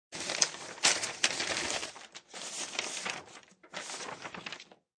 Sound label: Handling sheets of paper; Classroom; Sound Effects:Schools